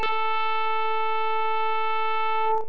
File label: saxo, synthesized